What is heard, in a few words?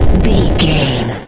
retro explosion deep